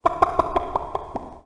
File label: chicken; Animal Sounds; Various Animals; Short calls